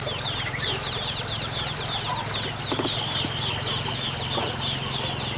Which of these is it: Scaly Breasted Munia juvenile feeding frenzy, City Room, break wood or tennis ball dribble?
Scaly Breasted Munia juvenile feeding frenzy